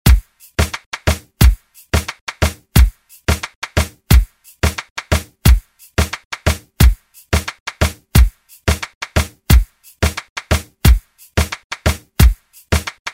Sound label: ReDrum; DJ Mhark